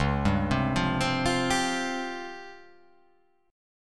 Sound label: C sharp Major 7th Flat 5th, chord